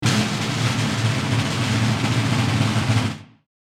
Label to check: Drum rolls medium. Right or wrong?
Right